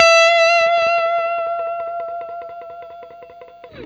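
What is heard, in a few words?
blues long vib, Guitar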